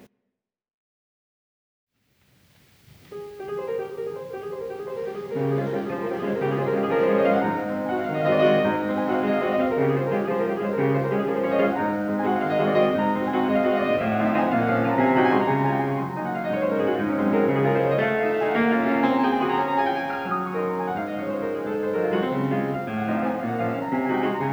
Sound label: D-Flat Waltz